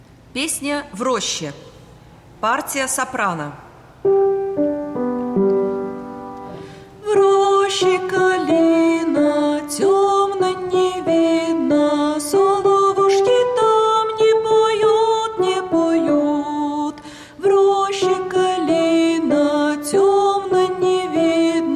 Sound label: soprano